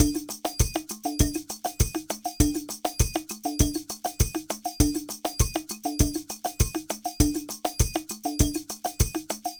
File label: Ensemble, Latin Percussion